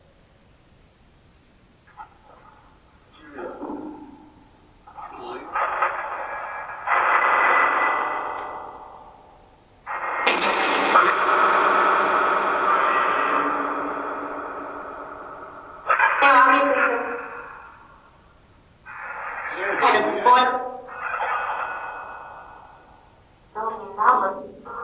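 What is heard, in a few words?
1st Floor Doll Room Phasma Box; fairfield county infirmary investigation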